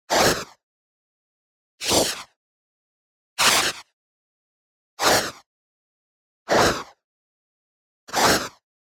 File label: WHOOSH Small Noise Pass By; boom library; Anime Essentials